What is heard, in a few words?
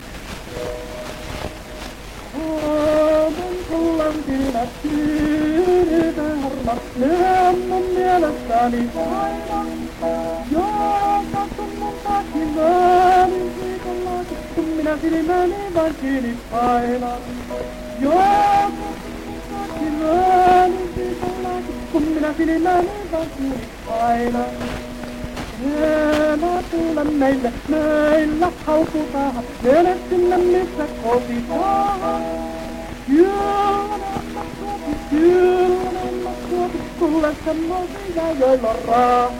Gramophone